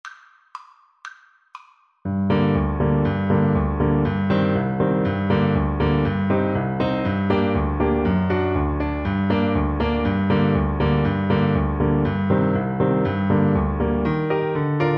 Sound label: Oboe; brahms hungarian dance 5